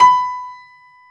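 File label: grand piano; B-GRAND PF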